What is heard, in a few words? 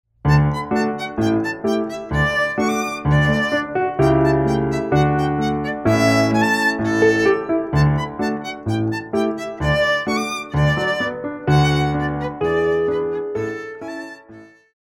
Gavotte for Violin; Gossec